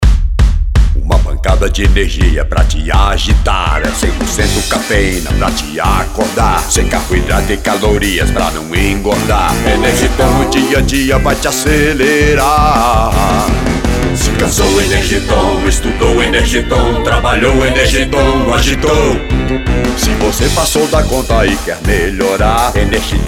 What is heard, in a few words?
Jingle; Energiton